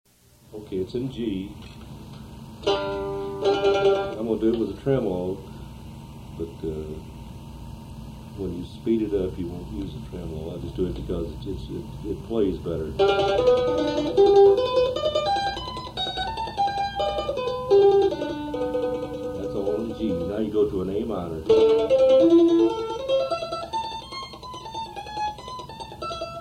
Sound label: Arpeggio Exercise